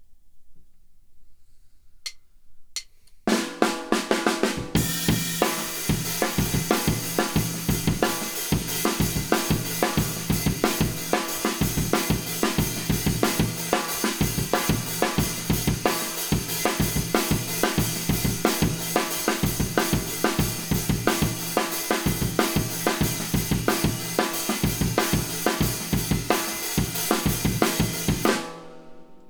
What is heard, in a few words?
Kit